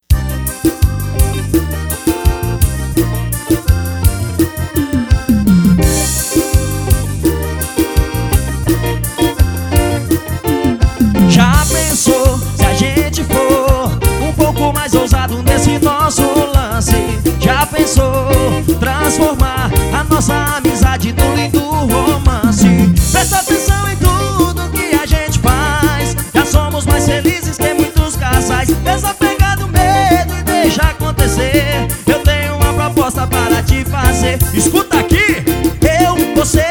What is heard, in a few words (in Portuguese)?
forro; promocional de setembro; cicero cds moral